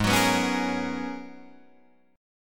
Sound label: G sharp Minor 11th G sharp Guitar Drop D, chord